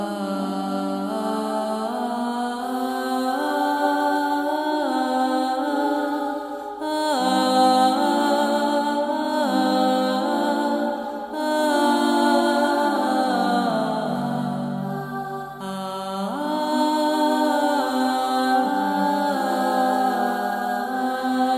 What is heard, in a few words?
Victorian Chant